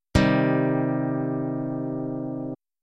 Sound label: c minor, guitar; chord contruction